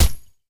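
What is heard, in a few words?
vsk silencer; STALZONE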